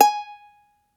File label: uke G#4